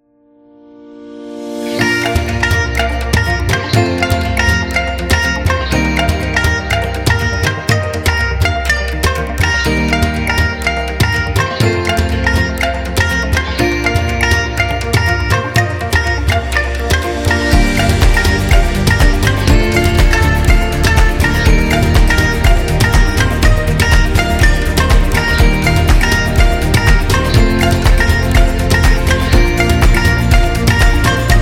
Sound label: India Positive